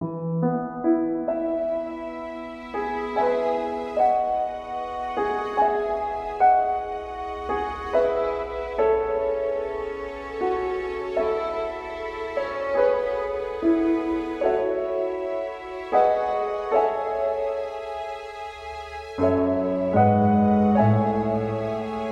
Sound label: Keys; Keys Music Pack Vol. 2